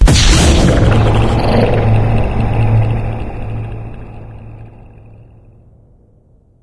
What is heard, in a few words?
advisor blast; ambient; source engine; ep2; outland